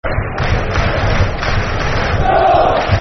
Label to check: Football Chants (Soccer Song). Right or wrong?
Right